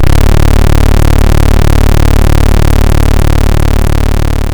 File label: evil presence; Junkbot